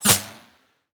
bullet flyby rifle close; Rust

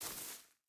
footstep boots grass walk; Rust

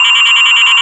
weap hellfire lock; cobra

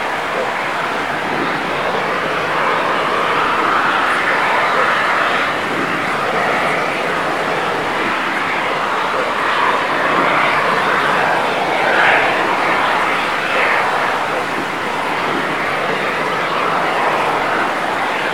airport fuel filling; Call of Duty Modern Warfare

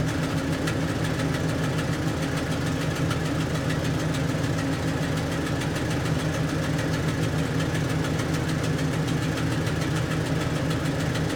engines; World of Tanks

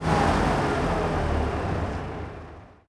engines; World of Tanks

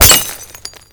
bullet impact plate; weapons; Modern Warfare

